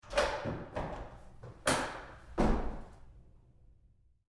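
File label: Door Bathroom Stall Open Roomy Medium with Lock; Big Room Sound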